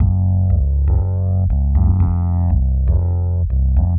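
Rhodes Bass Gb, Nu Jazz House, Bass Loops, 120 bpm; PowerFX - Nu Jazz House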